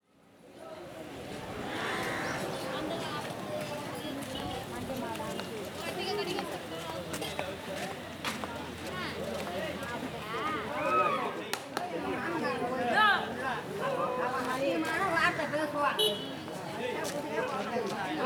street ambience - slums of madras - distant and close up dog barkings - women voice; The AudioVille; Atmospheres of India